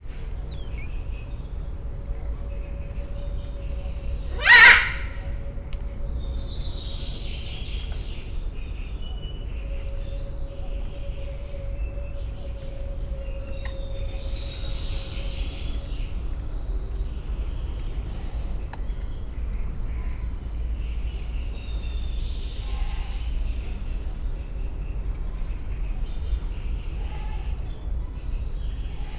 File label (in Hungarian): vékonyka hangocska, zöld ara papagáj, lisztmajmocska; szegedi zoo 2011